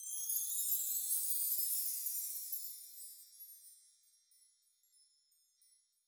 Perc Chimes; SSO Percs

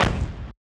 Kick Stomp; SSO Kicks

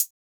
Hat Closed; SSO Hats

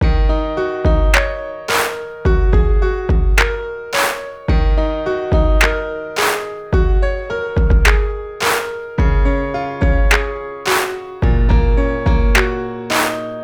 RNB 2 (107BPM)

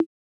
Perc; SSO Percs

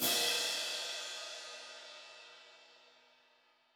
Crash Orch, Crashes; SSO Crashes

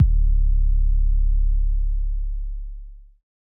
808 Drum; SSO 808 Drums; SOUNDZ N KITZ; SSO Kit vol 2

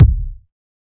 Kick; SSO Kicks

